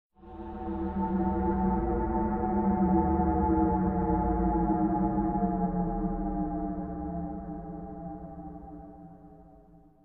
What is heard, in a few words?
stinger; Myst III Exile